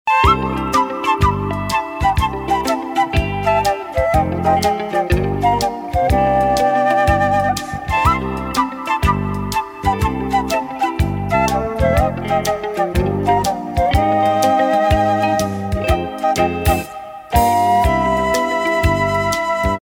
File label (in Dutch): WACHTMUZIEKJE; kermis geluiden